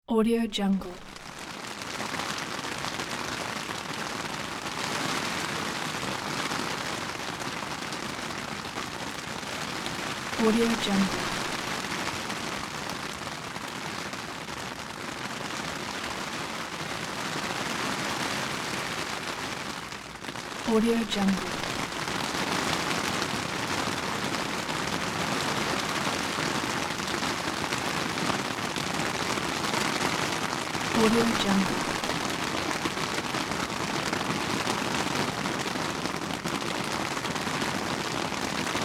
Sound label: rain on umbrella